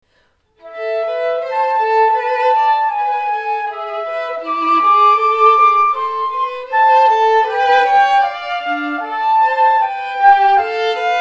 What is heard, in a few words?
flute